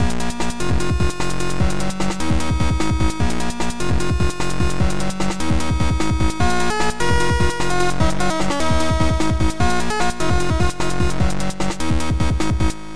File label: CHIP; Rage